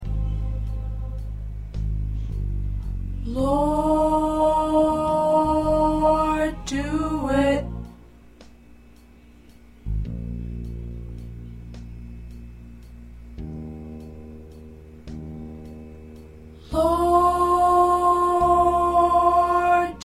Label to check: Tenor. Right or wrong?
Right